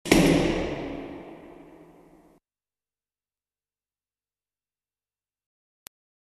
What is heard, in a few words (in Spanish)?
martillo